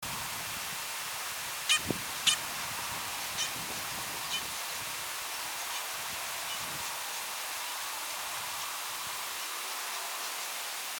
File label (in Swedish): Okentrumpetare